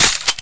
bolt slap; svencoop; paranoia; weapons

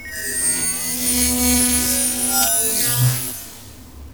PHONE; subway

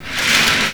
door move; svencoop; aomsounds

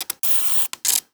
camera clip in, weapons; cstrike

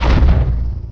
super phys launch

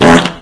pfff; gearbox

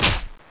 mushroom hit

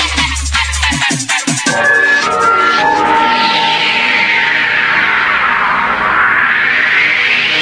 hardcore; tfc

